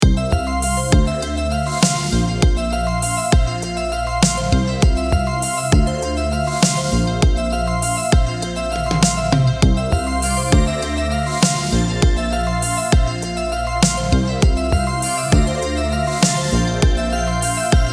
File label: synwave fun